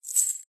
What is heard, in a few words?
industrial chain small grab grasp hand